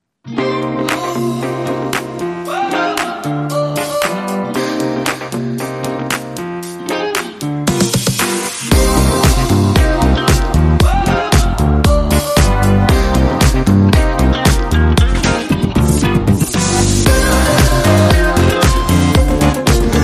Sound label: Disco funk